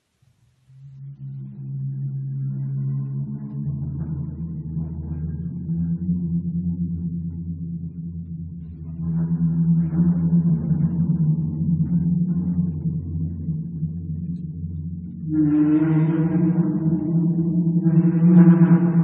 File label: horror sounds